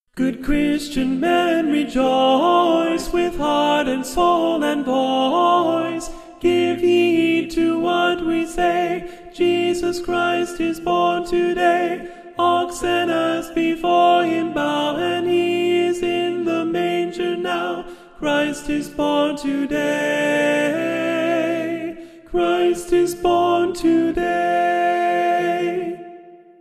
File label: good christian men alto